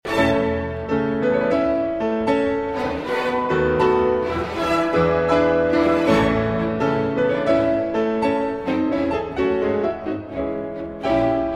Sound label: Piano Concerto in A Minor III.; Op. 54; Schumann